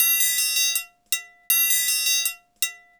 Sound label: TRIANGLE; Sonic Foundry (Sony Creative Software); Joe Vitale Latin Percussion